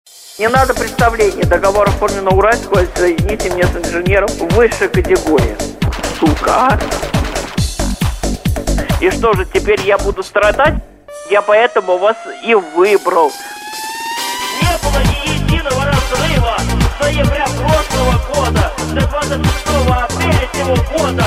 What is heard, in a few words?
techno